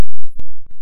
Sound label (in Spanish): Golpe